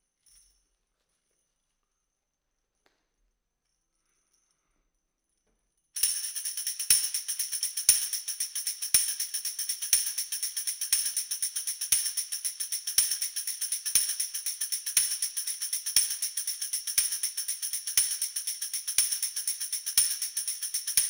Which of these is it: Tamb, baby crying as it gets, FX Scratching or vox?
Tamb